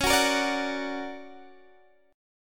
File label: D Augmented Major 7th C sharp, guitar